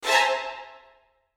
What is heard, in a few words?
high strings chord